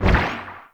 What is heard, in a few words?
Dash Bubble Start; Powers; Warframe; Focus; Operators